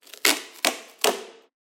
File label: taping; soundscrate